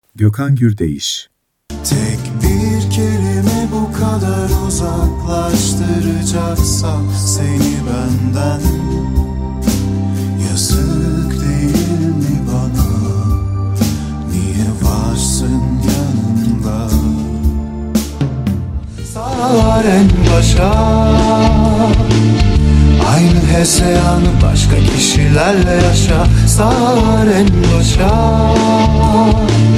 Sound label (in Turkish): vokal